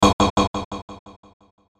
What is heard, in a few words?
Hit Airy Vox Delay; Blastwave